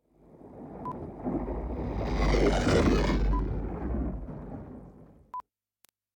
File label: Spaceship Whoosh